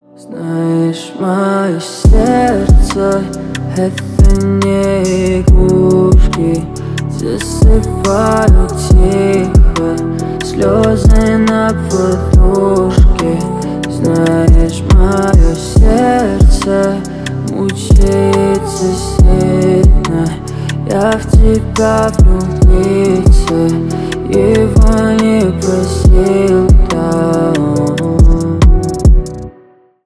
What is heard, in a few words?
acoustic